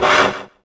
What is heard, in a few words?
saw